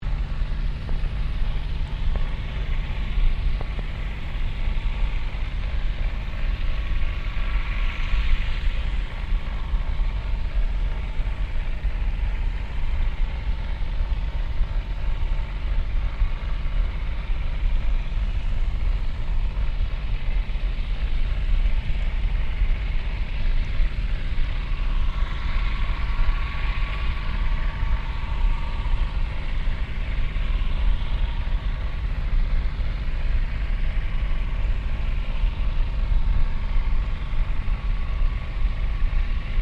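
Boat Custom Engines Operating Submerged Loop, small restaurant boat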